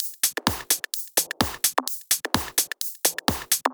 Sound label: Drumloops 128BPM